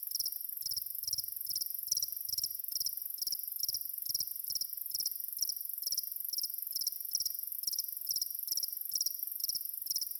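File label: ambience cemetery crickets; cemetary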